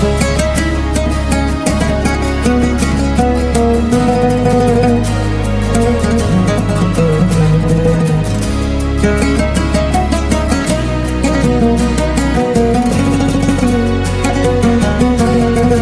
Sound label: Fabulous Guitar